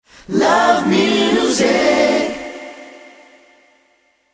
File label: ringtone